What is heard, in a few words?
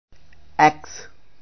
axe